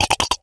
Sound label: spider sit; cstrike; bluezone; zombie